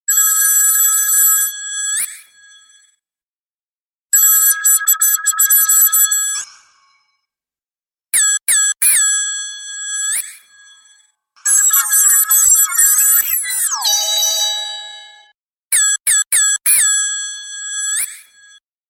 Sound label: Ring; ghia productions; fun fx